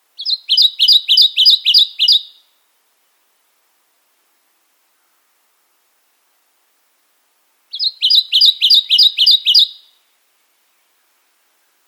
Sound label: Kentucky Warbler